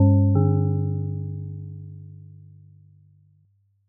china gong

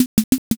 horse hoof

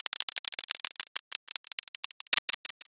roulette

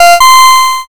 correct

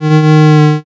creak

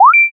unlock

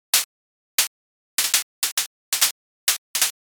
sparse clap

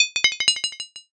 armor

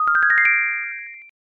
rainbow

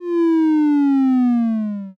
picosleepy

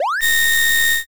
drill

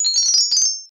xmas bell

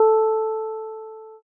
piano

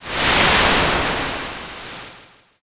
wind

